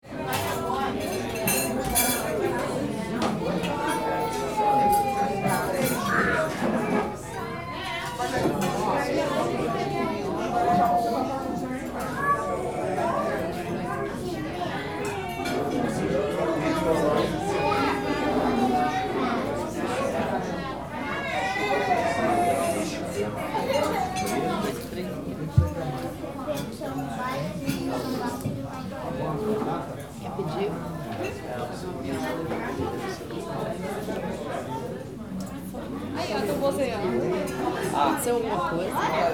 Restaurant ambience dishes clanking and people talking